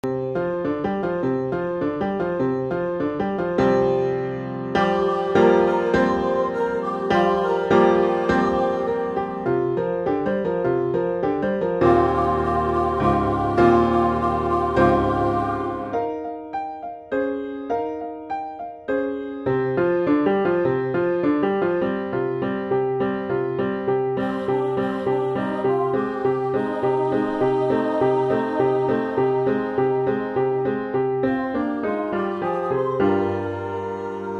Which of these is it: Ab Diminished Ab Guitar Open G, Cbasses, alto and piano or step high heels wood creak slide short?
alto and piano